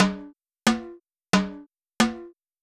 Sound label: junkyard rhythms, drums; Sonic Foundry (Sony Creative Software); Vital Drums